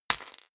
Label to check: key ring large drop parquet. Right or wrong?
Right